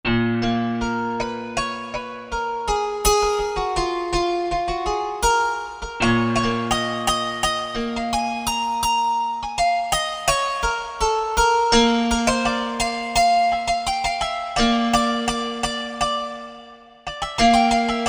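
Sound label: Ham Dulcimer, Korg MSC-10S Ethnic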